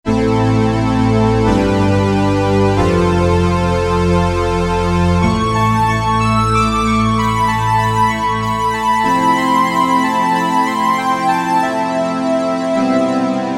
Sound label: Syn. Strings, Roland U-220; Factory Patches